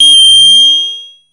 ODD RM BELL; Zero-G Ambient 1; ANABELL PERC

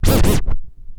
ST SCRCH, SCRATCH

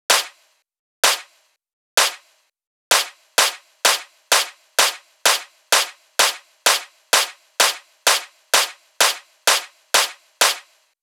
Clap, Drum Loop 128BPM; VEC5 Drumloops; VEC5 Multi Loops 128BPM; Loop Elements

